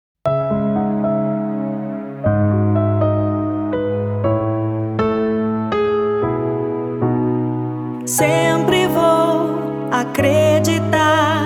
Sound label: Jingle; Supercap final de ano 2021